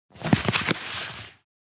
Objects office cardboard box scratching and crunching